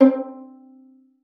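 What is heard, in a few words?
violin pizzicato C4; Xsample VOL-5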